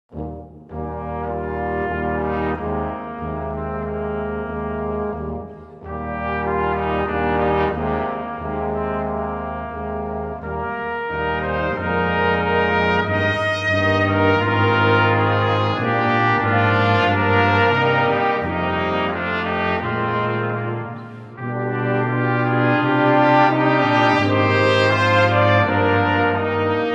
Hymns For Organ, Brass And Perc

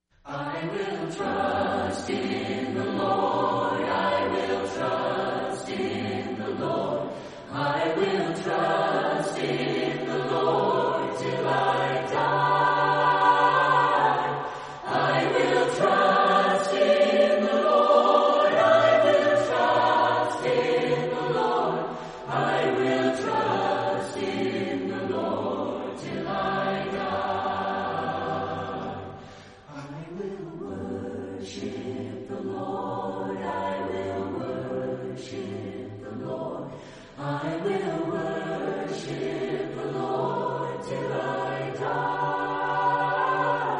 Chorale, Voices in Praise acappella

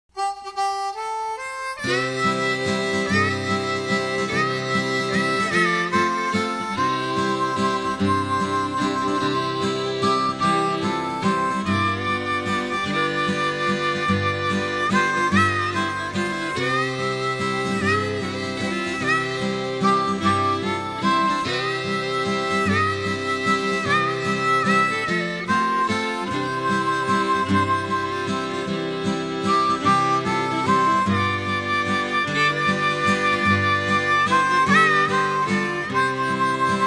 old time gospel instrumentals

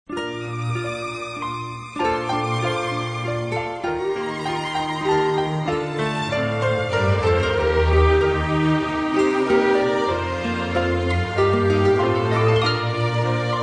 Orchestra, Strings

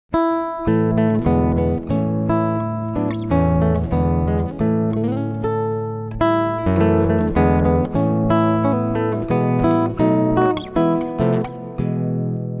Guitarist

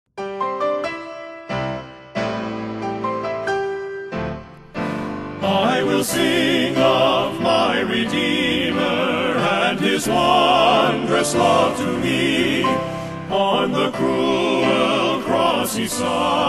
men's chorus

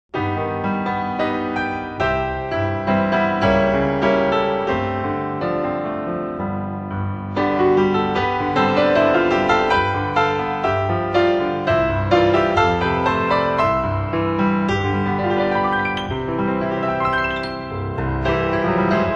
Keyboard Favorites

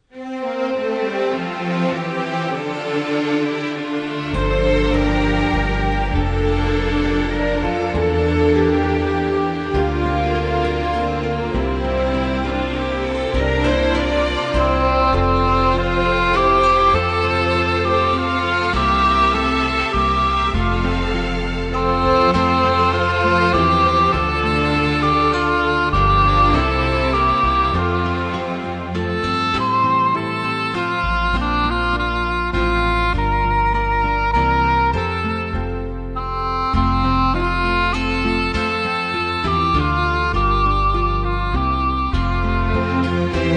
Instrumental Praise

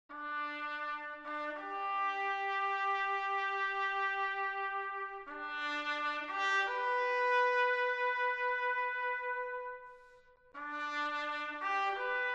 brass ensemble

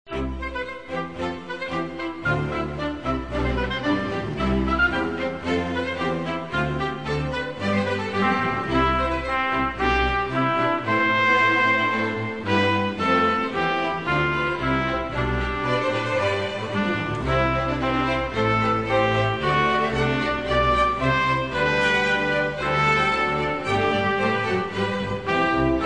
Orchestra, Strings

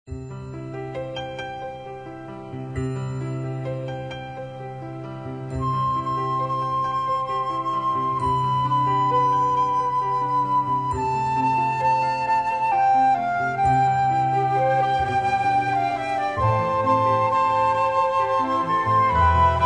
Orchestra, Strings

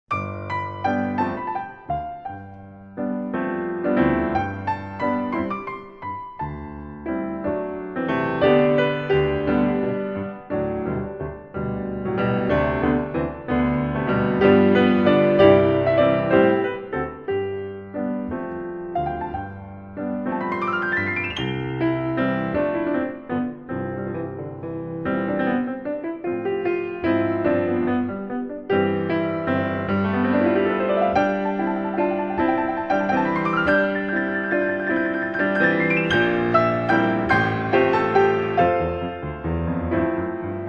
Sacred Arrangements For Piano

